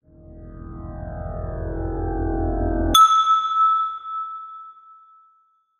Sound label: chime